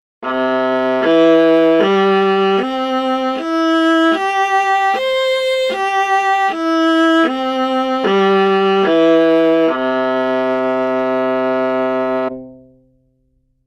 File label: viola